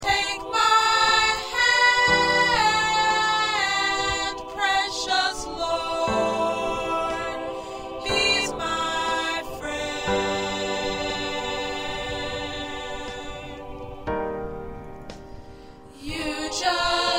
Alto

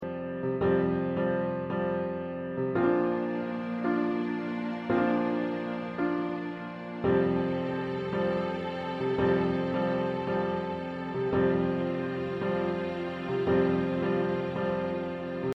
Accompaniment

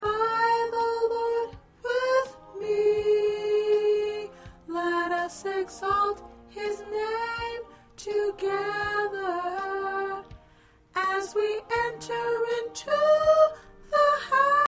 Soprano

